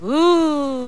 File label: "woo", voice